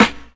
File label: puffy steel snare drum sample c sharp key